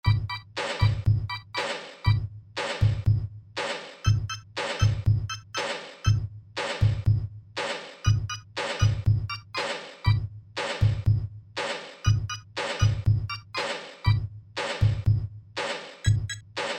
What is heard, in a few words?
Sinewave